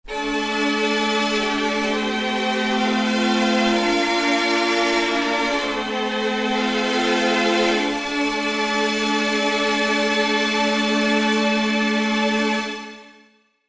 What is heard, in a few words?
3 violins, chamberlin